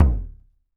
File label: KHOL HEAD, DRUMS OF IND